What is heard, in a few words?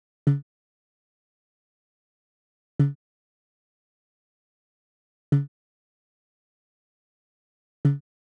Drum Loop 95 BPM Tom, Afrobeats; Loop Stems; Cymatics; GHOST (Beta Pack); Cruise Drum Loop